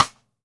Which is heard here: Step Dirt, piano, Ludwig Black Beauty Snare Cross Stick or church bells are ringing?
Ludwig Black Beauty Snare Cross Stick